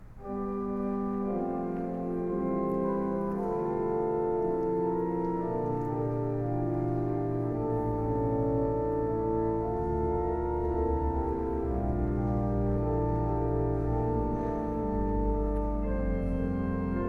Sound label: Organ interlude